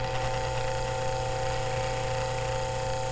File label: electric motor; outfly